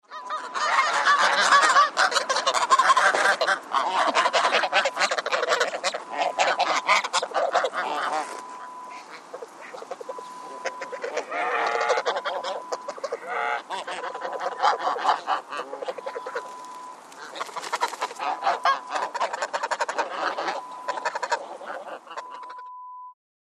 A Gaggle of Geese

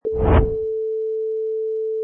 Wipe Short Tail

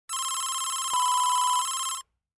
telephone ring

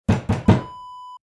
door knock

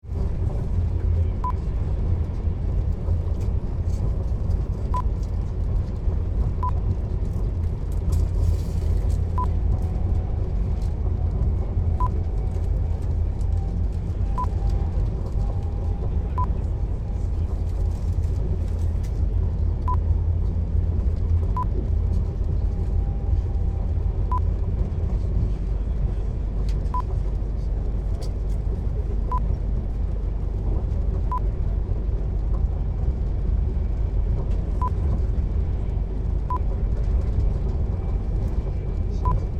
Onboard Modern Train Carriage

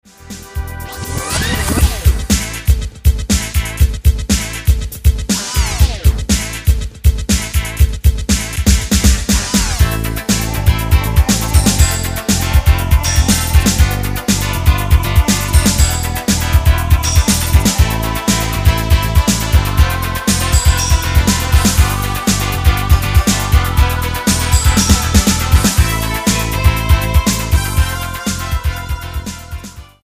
LATIN FEVER

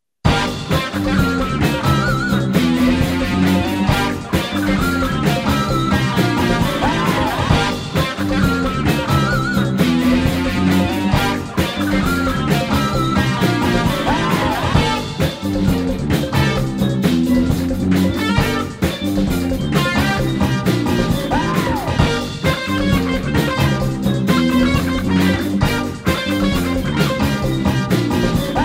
shred

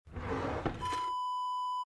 drawer kitchen open

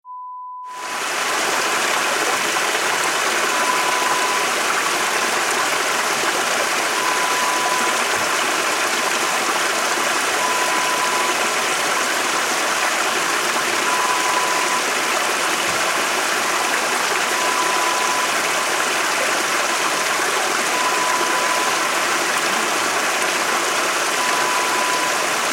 Waterfall sound

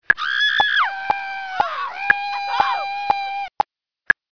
scream

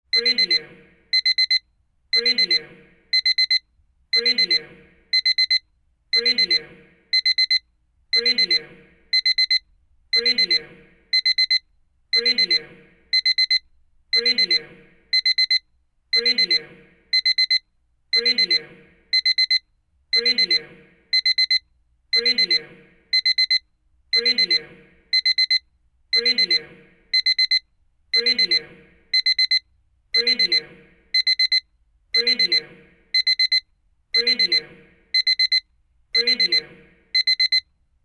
alarm clock beeping

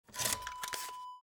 camcorder tape remove

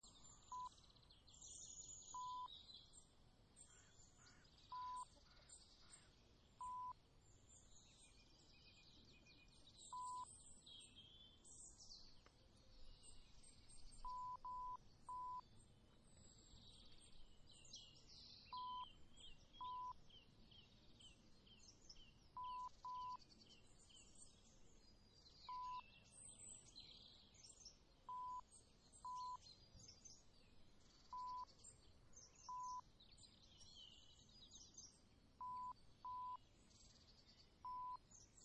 Garden Atmos

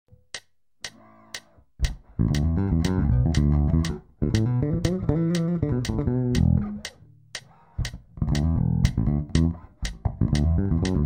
Fill in Em

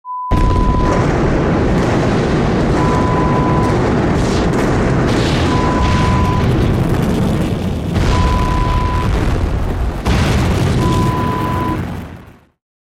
Explosion sound

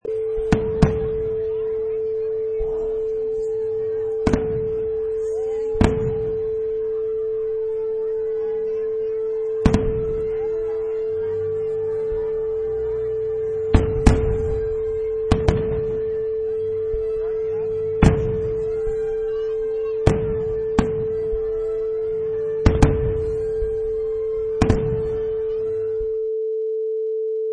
Firework Display

